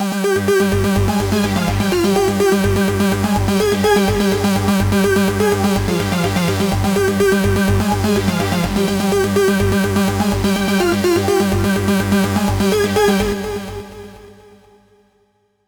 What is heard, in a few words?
Trance